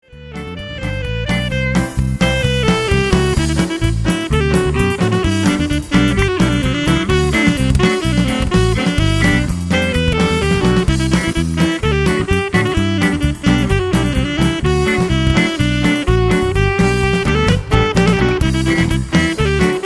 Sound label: Polka